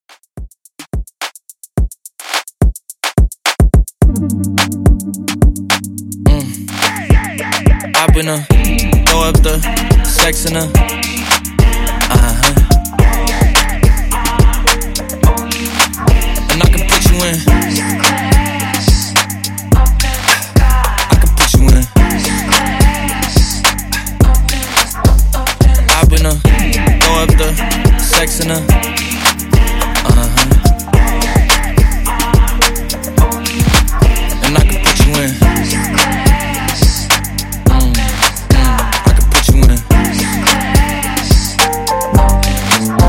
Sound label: HipHop Trap Redrum 107Bpm